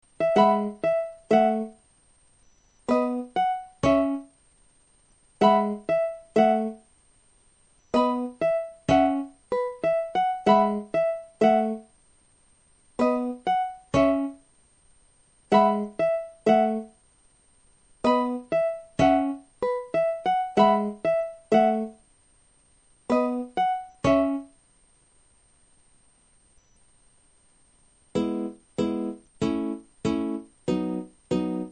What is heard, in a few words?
Accomp